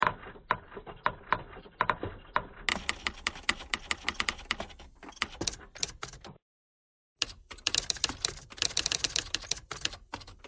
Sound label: typewriter thread pentatonic